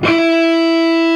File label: LONG SOLO E, HEAVY METAL; Greytsounds